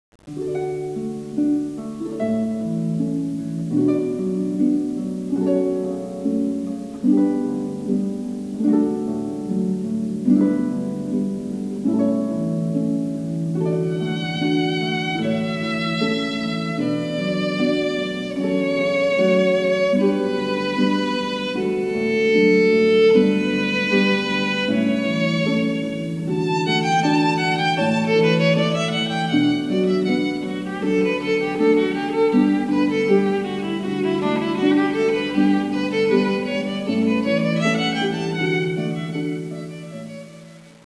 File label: canone pachelbell, arpa violino; ENTRATA SPOSA; Canone di Pachelbell